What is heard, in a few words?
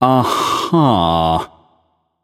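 agree, non-verbal; voicepack; smite; merlin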